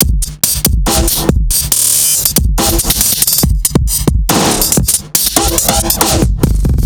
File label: drum